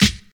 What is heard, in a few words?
90s treble heavy hip hop steel snare drum g key